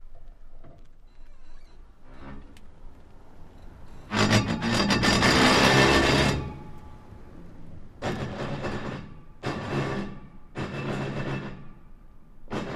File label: Car Transmission, bad transmission